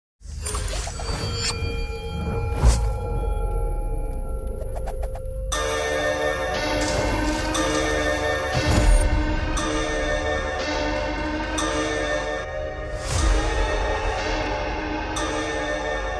villain bgm music ringtone; warrior movie; south villain

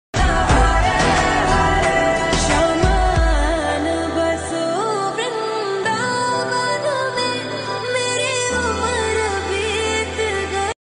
garba song

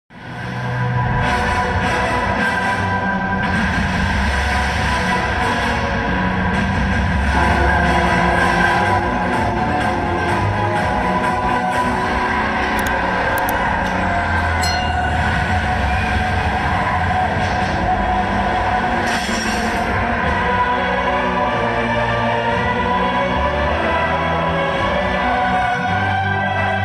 climax bgm ringtone; radhe shyam

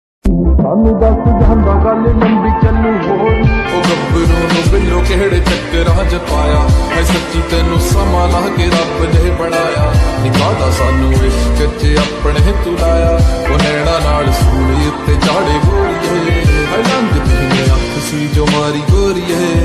song lofi

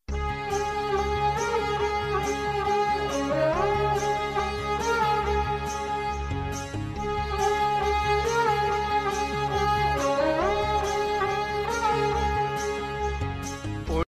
instrumental song, call ringtone; gadar 2 movie

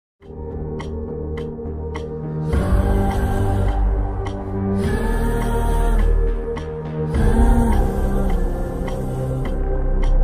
intro bgm; radhe shyam

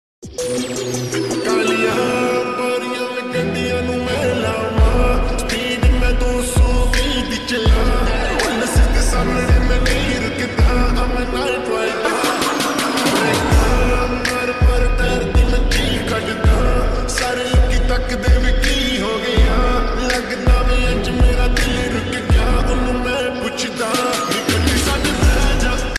ringtone ringtone

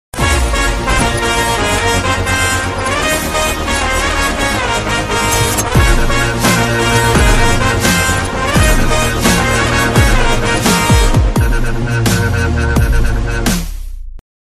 bgm ringtone in telugu; 90ml

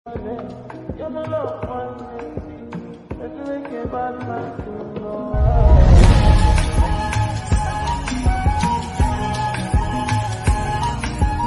remix ringtone bgm

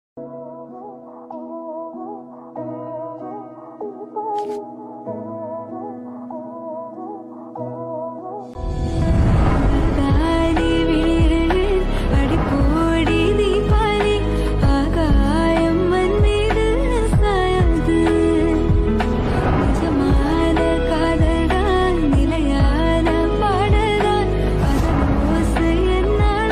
tamil song ringtone

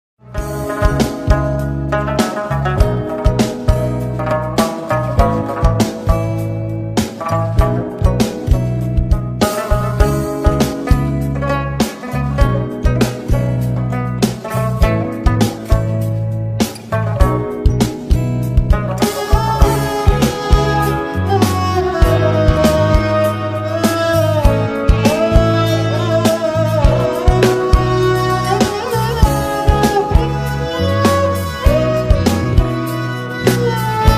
instrumental sad version aesthetic ringtone; nescafé basement

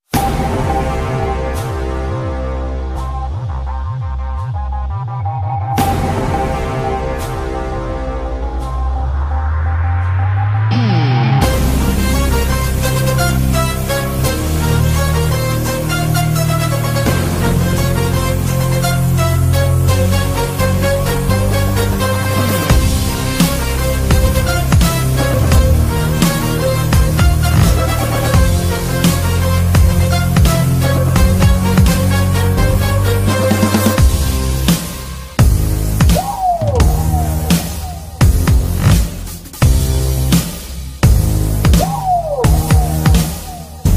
kannada movie bgm; chakravyuha; a a entertainers

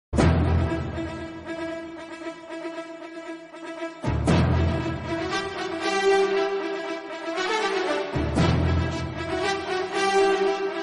movie bgm ringtone mass bgm ringtone; muthu movie; rajini

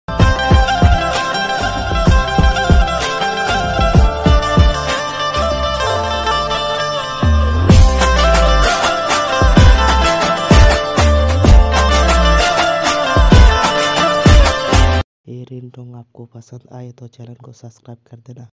marathi ringtone

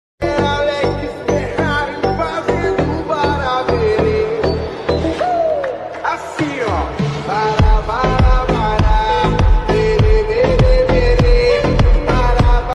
remix song

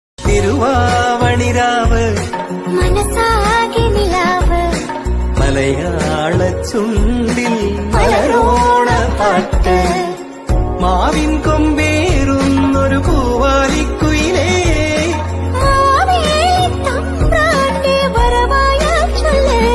onam song bgm, malayalam; love bgm

